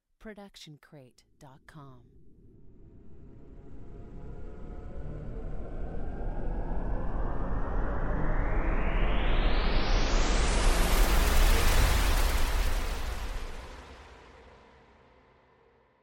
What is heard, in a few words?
Cinematic Riser